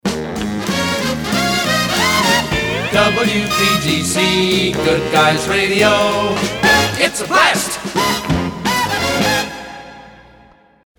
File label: jingles, its a blast; pams; series 27 jet set